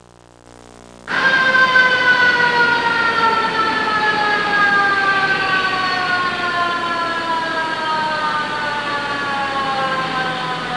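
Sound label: fire truck, transportation; fire trk; Web Clip Empire 150,000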